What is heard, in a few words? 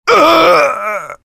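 soldier pain critical death; voice packs; soldier nukem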